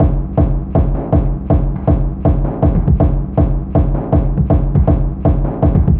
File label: Beats Idle Loop; Anger Foot